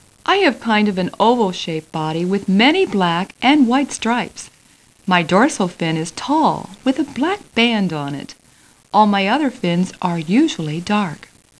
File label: highhat